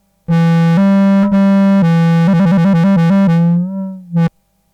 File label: glissendo